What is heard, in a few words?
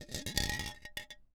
Grenade Roll; zps; Weapons; Explosives